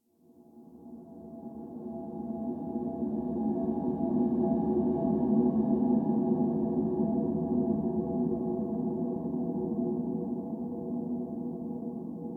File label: Exterior Texture, Ambience; Warframe; Rail Jack